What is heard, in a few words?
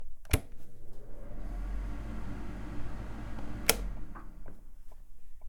Bathroom Fan, On And Run; UberDuo; The Mountain Townhouse Audio Playset